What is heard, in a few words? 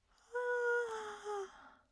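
voice yawn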